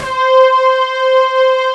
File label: CHIFF SYNTH